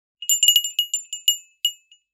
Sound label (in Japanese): 小さいベルの音; 場面切り替えや心理描写; 演出効果音